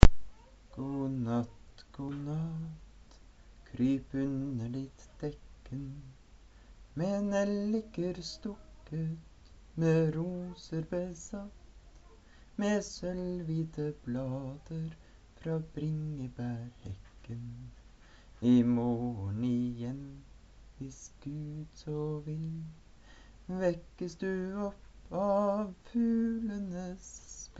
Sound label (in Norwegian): God natt god natt